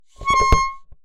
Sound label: Ornament, Melodica